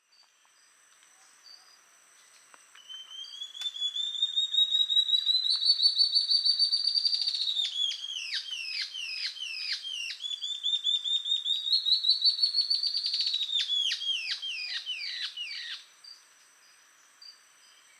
Ocellated Antbird Phaenostictus mcleannani mcleannani; tropical birdsong